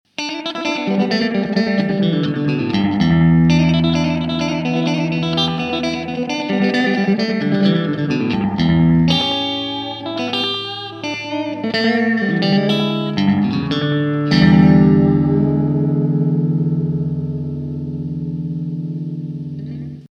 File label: Slapback Delay